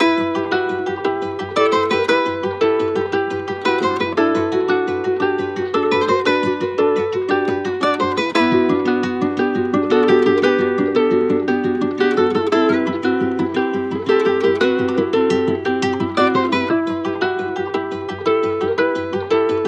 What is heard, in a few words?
115 BPM E Min, Guitar Loops; Running Away; Cymatics; Journey Guitar Loops